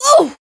damaged

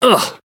damaged

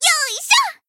attack, jp

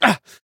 damaged

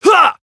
Voice attack; shigure

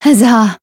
healed

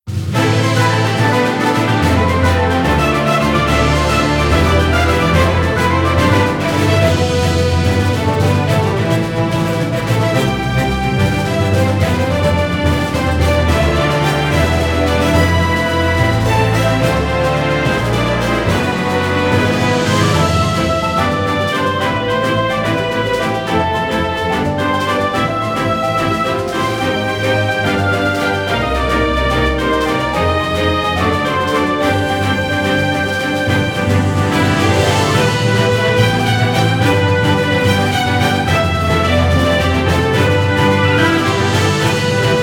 Bgm dungeon dimensional vortex

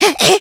attack

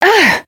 Voice damaged

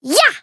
Voice attack; thillelille

